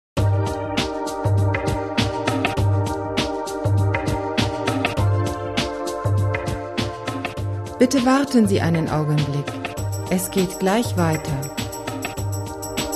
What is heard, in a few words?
relaxing